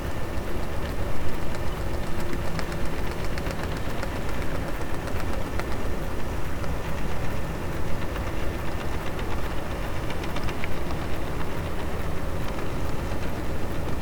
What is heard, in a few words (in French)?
cachalot negatif, Physeter Macrocephalus